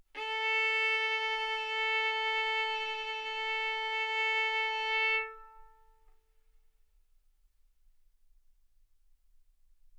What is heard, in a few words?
Violin arco A4 B4; mf; sul A; stereo